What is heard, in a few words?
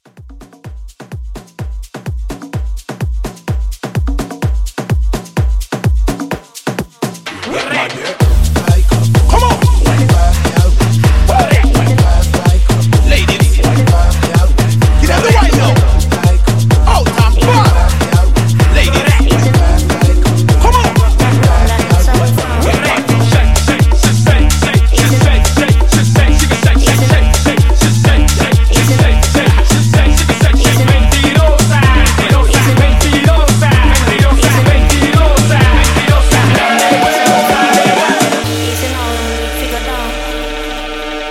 Tribal Mashup